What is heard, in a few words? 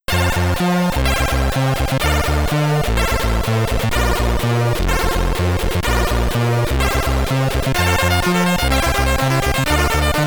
Level Tune, commodore 64; Forester